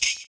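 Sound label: PERC, percussions; Univers Sons - Raricussions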